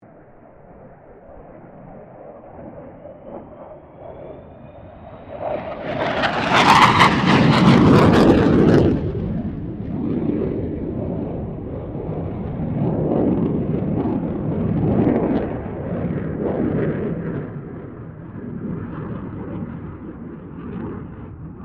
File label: AIRCRAFT FA-18 HORNET PASS; RAAF FA-18 Hornet; Airplanes